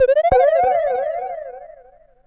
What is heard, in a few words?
Perc Synth Moog Delay